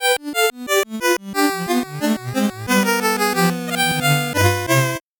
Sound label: 8bit jingle slow